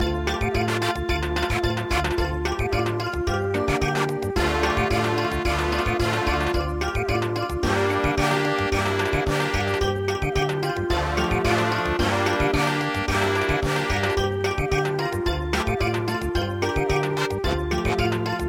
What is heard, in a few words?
samba, midi; hana ichimonme